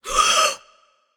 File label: breath; smite; voicepack; morgan le fay